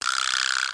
RAZZ; 2000 Sound Effects

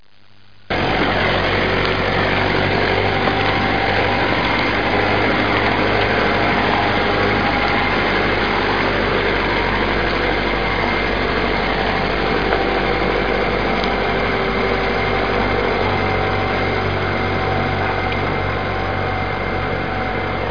unimog

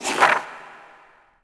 turn page

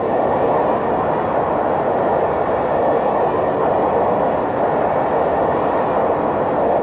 JET LP, VEHICLES

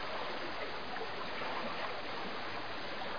waves

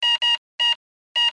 beep; zones; industrial

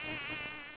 Mosquito; Amazon Trail

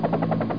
Helicopter Loop; Clear and Present Interactive

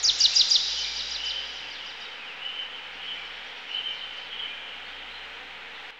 AMBNT 160; eJay; Ambient

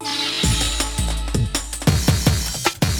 drum loop D+B 160; Drum+Bass

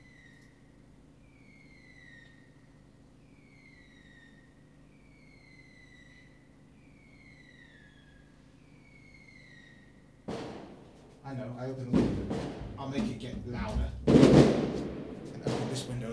fireworks

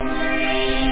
glide, synth

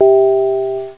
Chime Lo; Sound Library 2000; Wayzata Technology

